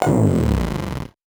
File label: VocoBit Noise, 8-bit; musicradar; 8-bit bonanza